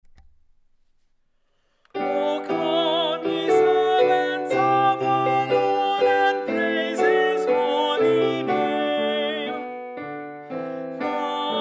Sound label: Alto; O Come, Ye Servants of the Lord, C. Tye